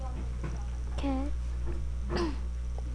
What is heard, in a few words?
kick